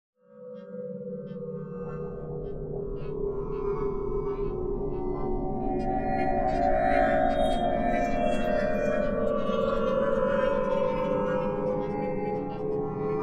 Inside Track Long Swirls

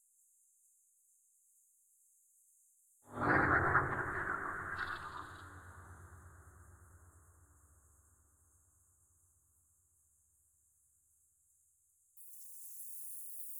crunch strata

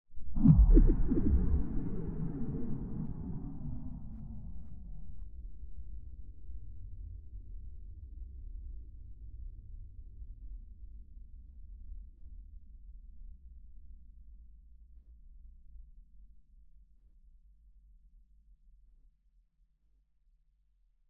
crunch woomph

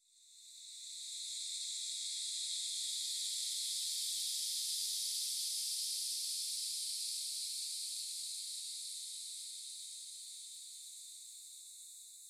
crunch strata

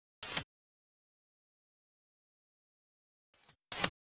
In Flight Birds

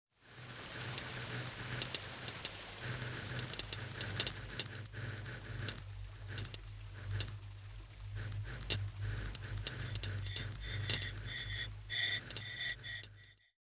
time clicks fire

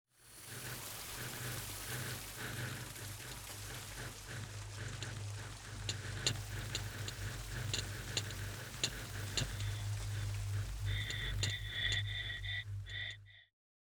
time clicks fire

